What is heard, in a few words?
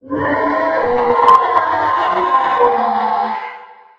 poltergeist die; STALKER; customnpcs